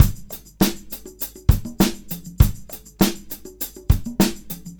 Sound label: Combo, R&B Drums